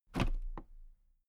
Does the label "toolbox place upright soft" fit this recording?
Yes